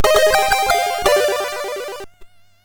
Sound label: Jingles, MOS6581R4 sid